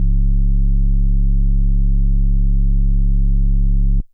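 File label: FM Sq Dist DX100 C#1; square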